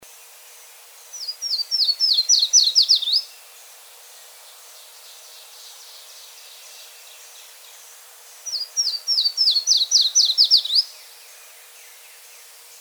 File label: Yellow-throated Warbler Setophaga dominica dominica